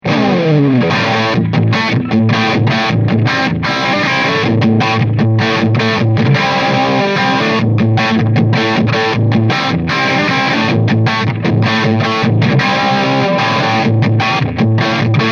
Hot Channel Lead; Koch JUP45 H

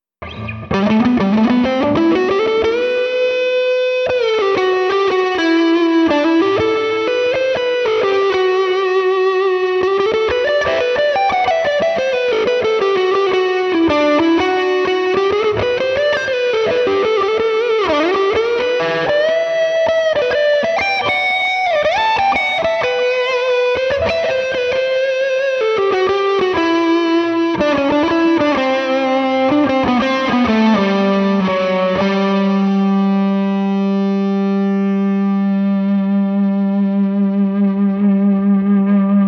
creamy neck HB

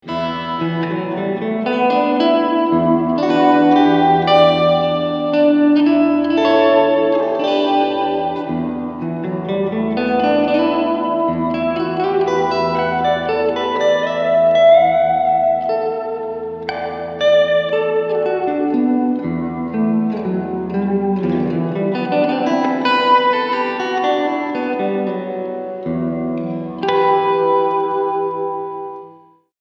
Spring Maximum

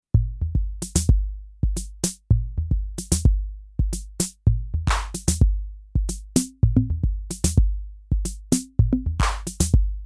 Drumbrute

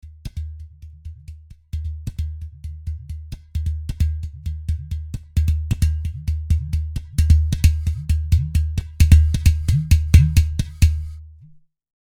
Culture Multi Udu Afric Velocity

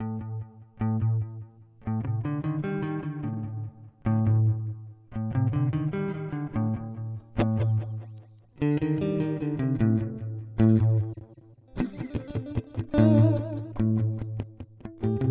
Starlight Echo Station Tape C

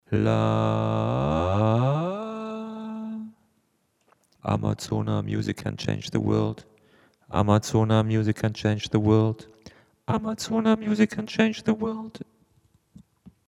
Roland VT-4 Pitch

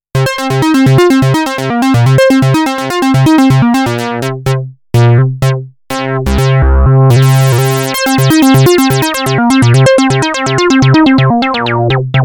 reso normal; MFB Dominion Club

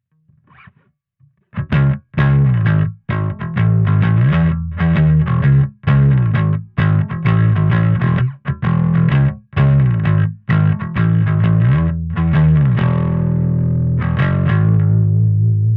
Bass Pick Rock; ProgBass

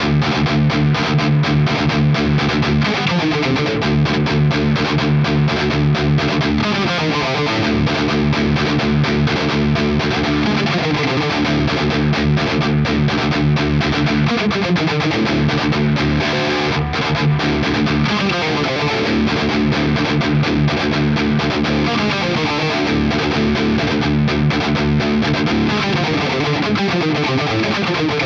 Nux MG-30 Hiwatt Metal

